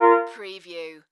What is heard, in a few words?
Multimedia Chime